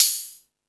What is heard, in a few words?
Rap Session, E-MU Pro-Cussion